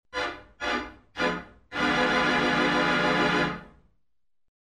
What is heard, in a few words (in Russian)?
dramatizm situacii organ